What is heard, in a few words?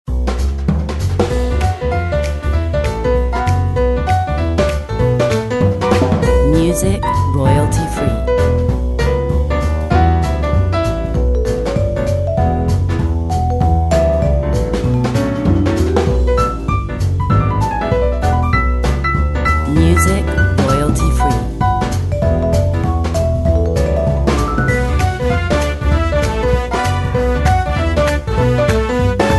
Jazz Swing